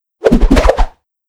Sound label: swoef